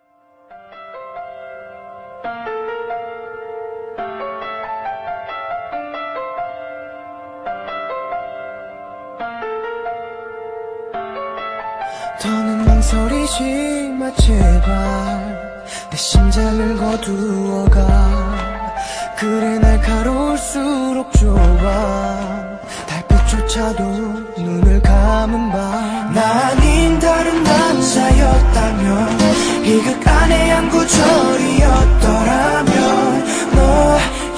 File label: korean chinese audio